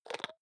hygiene small cup open medium; haus-garten; badezimmer

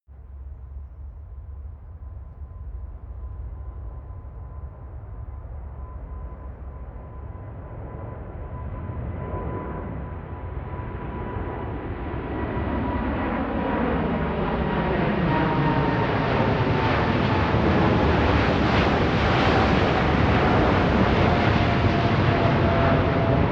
plane flyby land tonal; flugzeuge verkehrsflugzeuge; verkehr transport

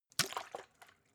bottle water upend, gastronomie; berufe

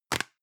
plastic can lid screw close hard; objekte; dose

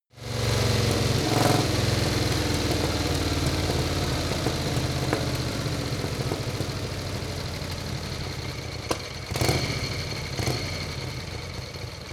motorcycle harley engine decelerate

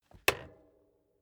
bicycle street kickstand hinge up; fahrrad; verkehr transport

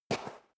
step sneaker sand single right

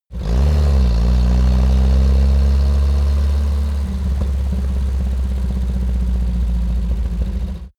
car uaz 469 arriving decelerate

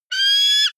offspring eagle call, voegel

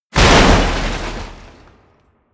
smash, baugewerbe

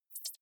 hover high, signale

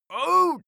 male hit

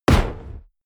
fire burst dry; whooshes rises; hits impacts

